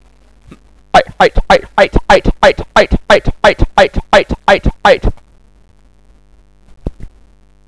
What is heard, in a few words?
bite